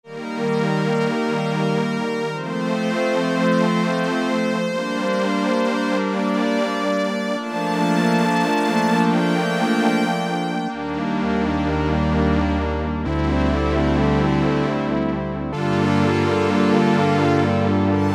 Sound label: BRIGHT STRINGS, Oberheim OB-8; Factory Patches